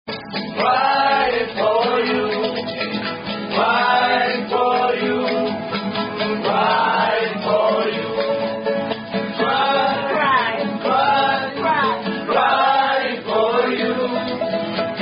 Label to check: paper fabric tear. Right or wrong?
Wrong